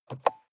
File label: sports car int compartment open soft; verkehr-transport